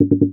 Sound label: Actions Available, Mac VoiceOver Sounds